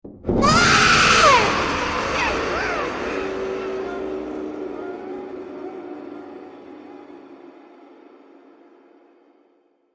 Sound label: Child Scream in the Distance; horror